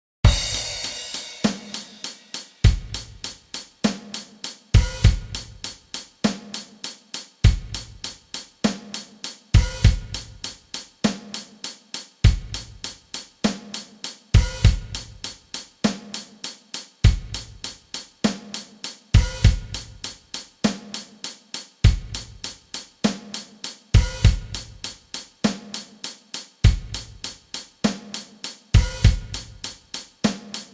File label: Drums